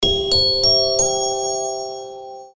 bell info, jingle

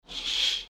curtain

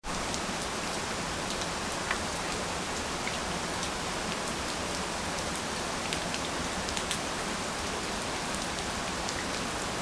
rain

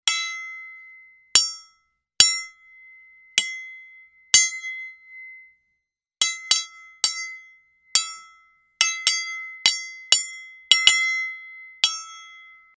sword

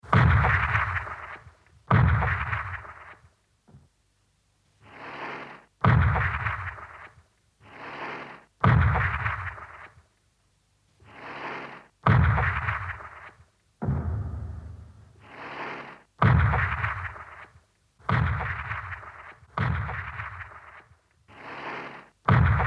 japan cannon

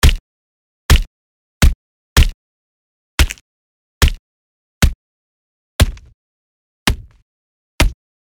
punch

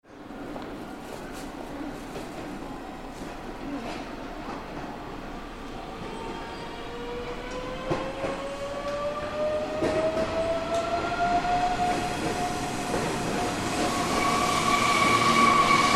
train

